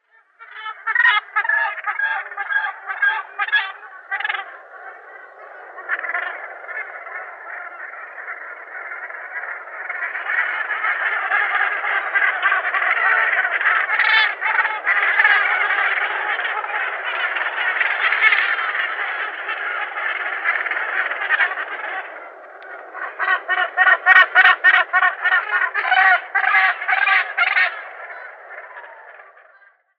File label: Cape Gannet